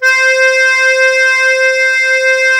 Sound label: DIATO2 C3, Historical Instruments; USB Soundscan vol.23